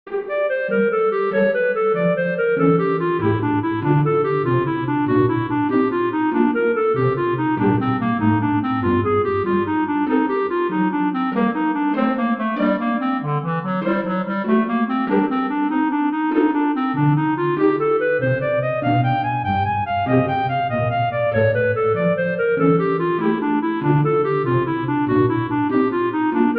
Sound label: prelude for clarinet strings; Johann Sebastian Bach; hilf gott daa mir 039 gelinge